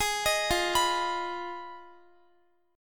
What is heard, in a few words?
F Minor 7th Flat 5th, Guitar; Fm7b5; arpeggiated; x,x,20,20,17,19